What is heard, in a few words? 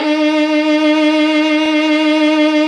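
VIOLIN VIBRATO; Sound & Vision; Gigapack I CD 2; Violins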